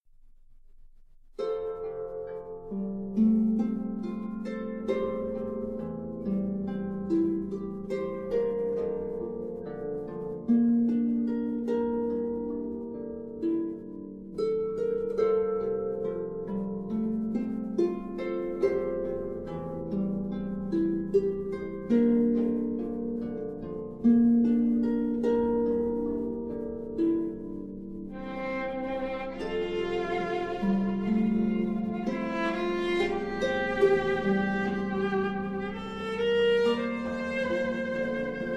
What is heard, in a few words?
celtic violin